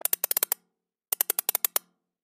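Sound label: neji loop, screw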